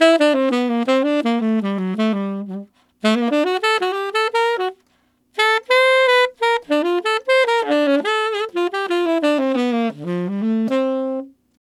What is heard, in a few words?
Tenor Swing Shuffle in C7, Tenor Sax Loops; Sonic Foundry (Sony Creative Software); Jazz Solos and Sections